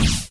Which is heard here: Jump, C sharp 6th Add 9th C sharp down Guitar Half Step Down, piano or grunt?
Jump